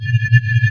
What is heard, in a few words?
cloaking loop; Earth and Beyond; EA Games